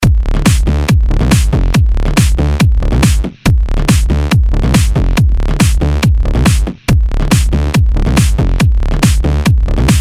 Trance Beat

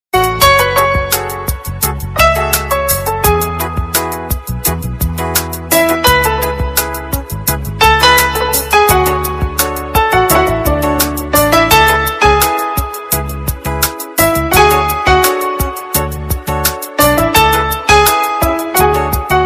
music

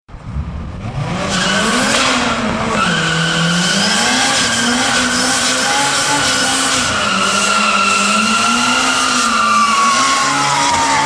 Honda Civic Drift

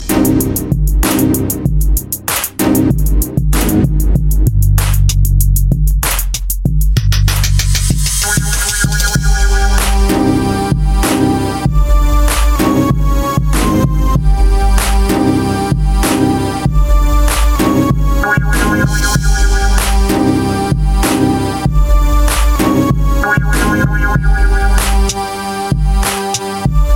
Gangsta Beat

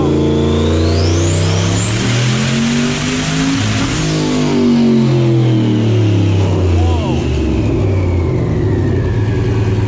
750hp Turbo Vette

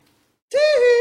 Teehee

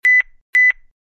Beep Beep

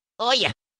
Chest Open; Luigi

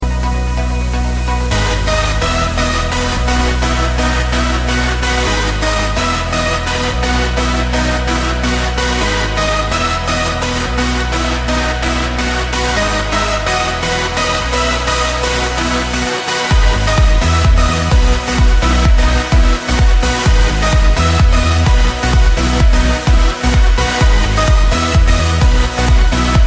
Dancefloor Beat

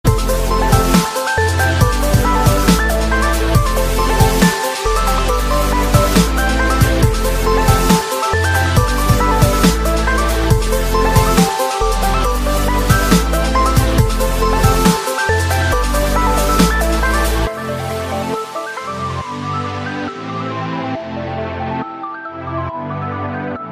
Celeste

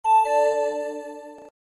Echo Xp

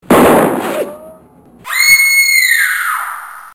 Shotgun Scream

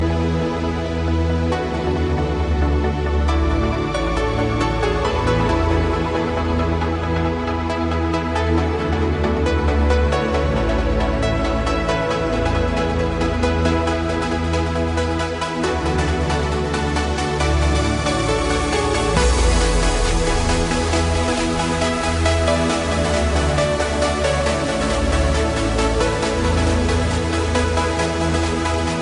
Gentle Trance

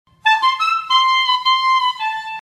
Wiggle clarinet

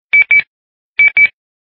Nextel Sms

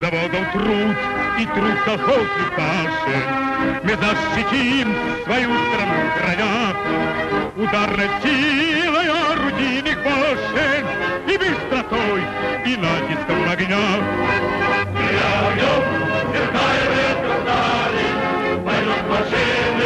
Tankers march

